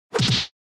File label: Hit